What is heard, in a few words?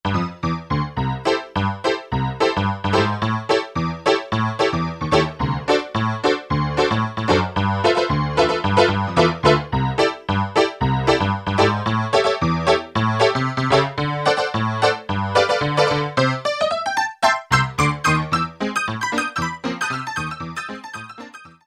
Dtun Piano, Roland U-110; Factory Patch